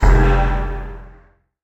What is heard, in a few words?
shield; smite; khepri